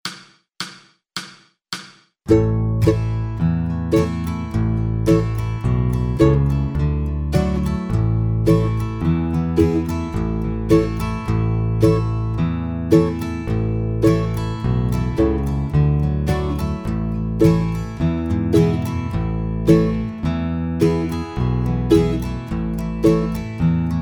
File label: deck the halls rhythm basic